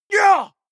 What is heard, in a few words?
male combat attack short; schreie; menschen